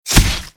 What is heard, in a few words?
impact blade